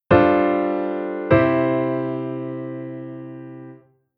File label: Chord IIm7onV-I